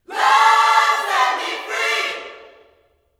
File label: LUV FREE, Vocal Gospel; Vocal Planet Vol1 Gospel